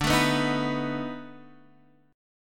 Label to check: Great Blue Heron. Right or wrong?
Wrong